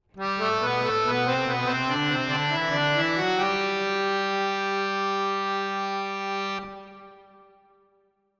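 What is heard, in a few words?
harmonium 3-4 70 verb; Sample Banks; Harmonioum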